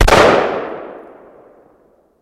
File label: Gun Ar15 Sngl Shot